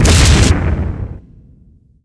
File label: Chain blast; destructions; ImpGal2; spacewar